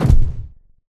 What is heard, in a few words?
footstep generic dist; Titanfall 2